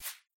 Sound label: board highlight